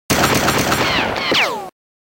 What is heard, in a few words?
Shooting